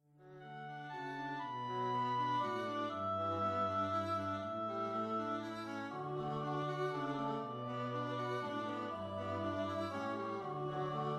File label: organ